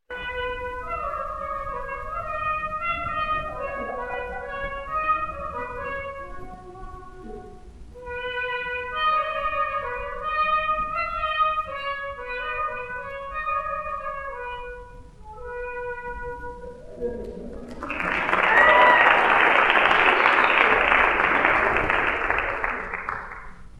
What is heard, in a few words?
Hosaphone